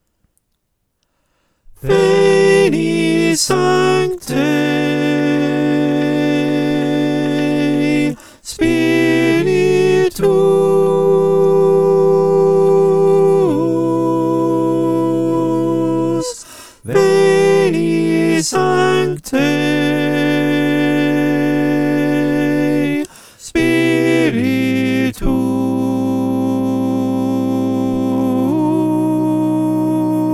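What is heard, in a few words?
alto, choir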